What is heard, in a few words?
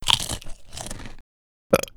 dispenser omnomnom